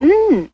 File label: mmm